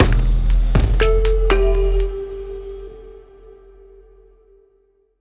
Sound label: Mission Lose; car simulator 2